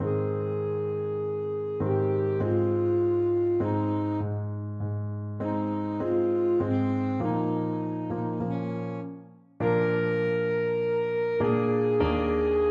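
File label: alto saxophone; locus iste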